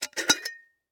Specimen Jar Open Lid; Medical; Blastwave

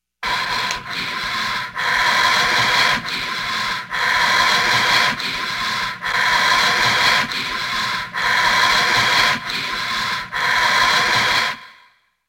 Scary Heavy Breathing; Halloween Havoc - Sound Effects; Ktel

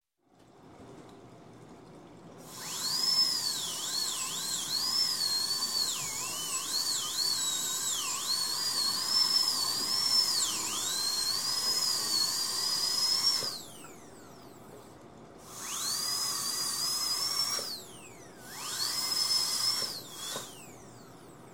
Dentist, Drill Drilling Patient's Tooth; Sound Ideas 2000 Series

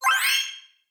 Power Up Game; Blind Drive

